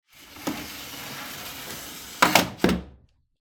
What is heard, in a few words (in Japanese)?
襖扉を閉める音; door